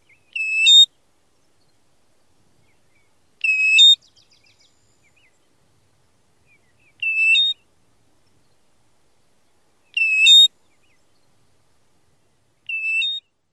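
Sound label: American Golden-Plover ko-Wee calls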